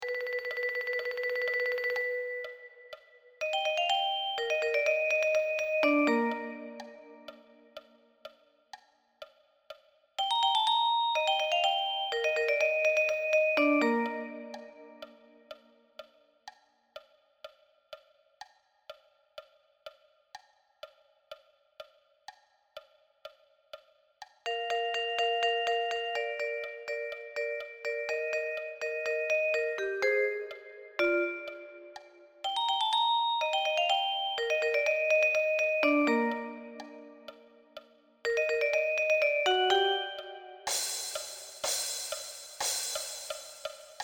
Vibraphone

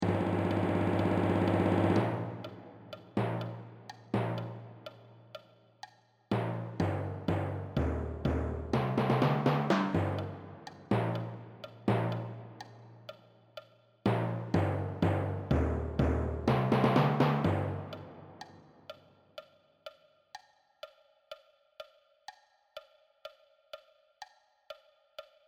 Timpani

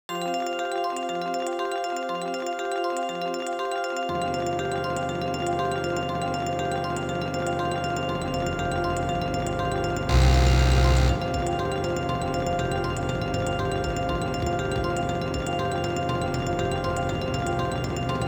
Percussion

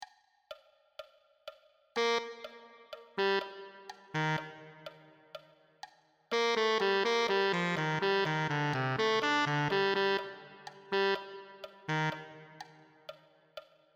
Tenor Sax

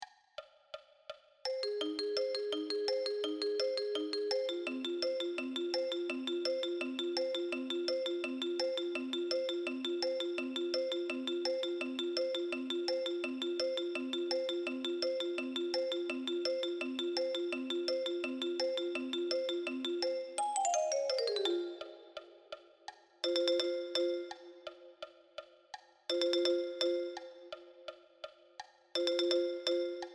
Marimba

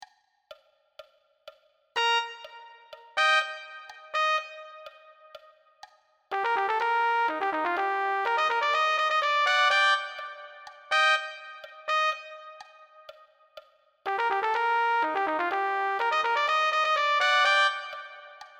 Trumpet

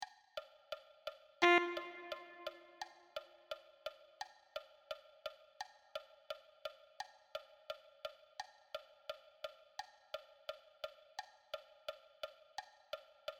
Alto Sax

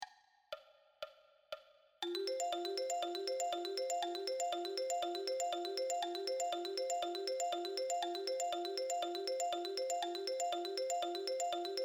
Marimba

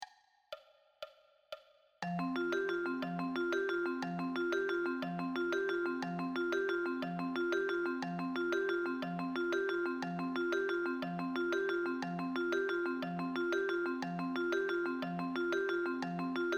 Vibraphone

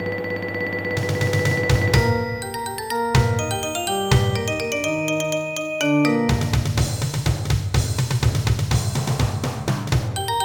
Percussion

